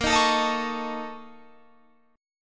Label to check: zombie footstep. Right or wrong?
Wrong